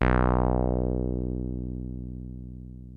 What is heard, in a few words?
SOFT SAW 303, ROLAND TB-303 BASS; Rubber Chicken Software Sample Bank